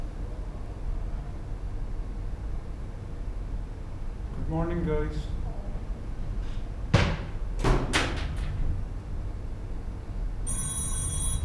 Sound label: office; IEEE AASP; events office synthetic; snr0 high